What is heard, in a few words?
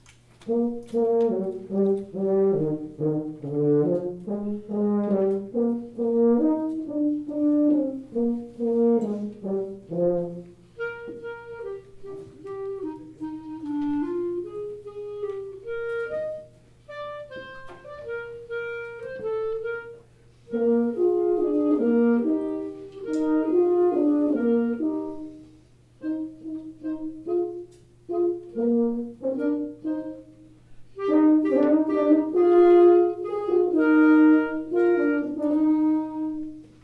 Duet